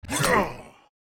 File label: Attack Centaur